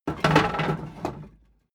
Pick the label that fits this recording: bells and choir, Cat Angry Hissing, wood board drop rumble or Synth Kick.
wood board drop rumble